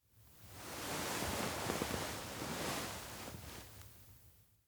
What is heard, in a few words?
futon shift; anemoi